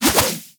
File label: Add Mineral To Meal Throw Whoosh; Alchemy Lab; Warframe; Hub; Liset